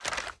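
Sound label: Inspect Movement; Valorant; guardian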